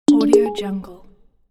error

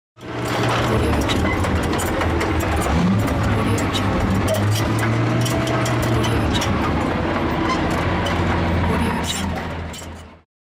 tank

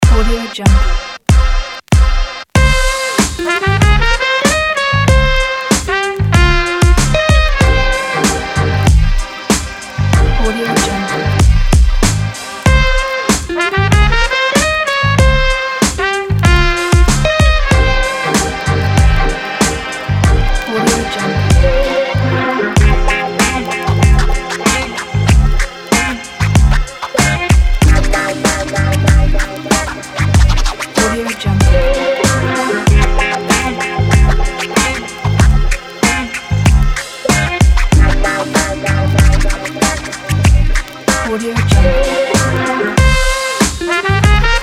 hiphop

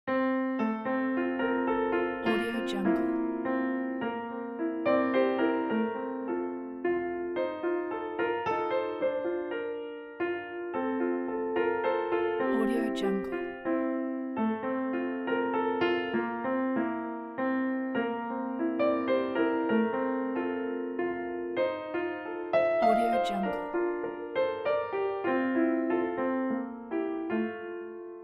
simple waltz; holyhammer

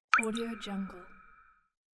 water drop

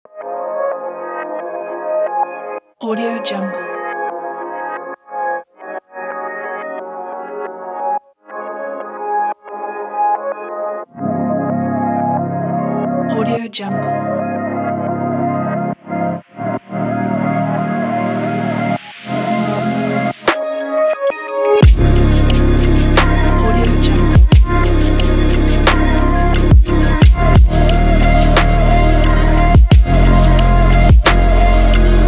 abstract trap electronic

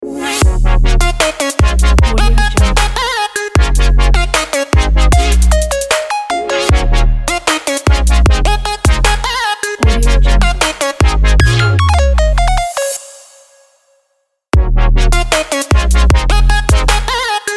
happy excited intro

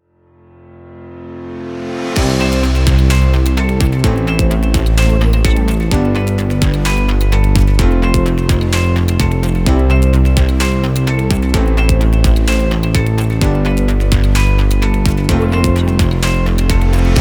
innovation technology inspiring

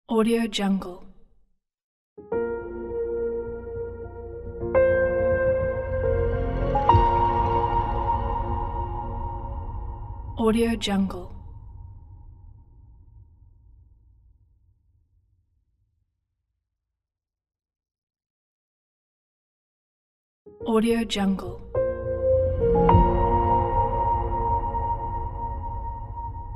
mystic piano logo

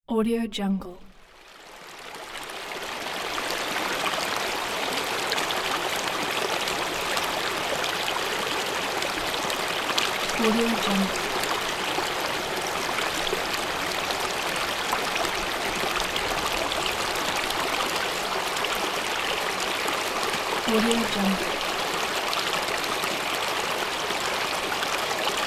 water flow

